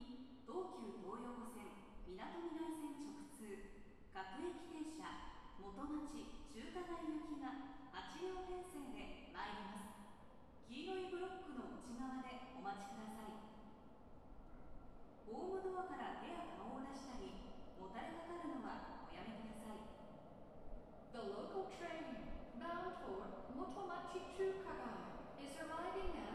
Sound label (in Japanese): メトロ